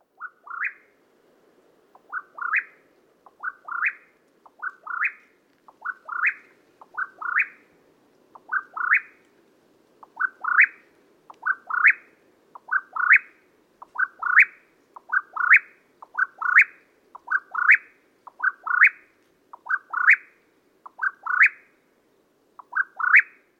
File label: Whip-poor-will Mexican